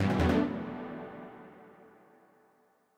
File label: battle intro; cross-code; cargoship